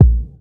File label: short kick single hit a sharp key; Duration 0:01; Channels Mono; kick drum samples